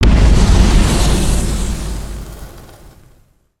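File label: Fire Tornado End Blast; Spinjitsu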